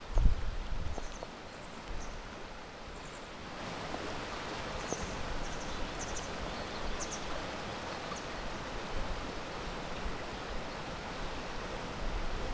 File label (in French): l eau qui coule